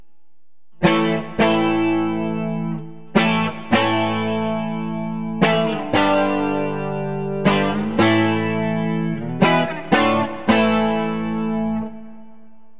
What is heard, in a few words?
bridge clean; fralin; burny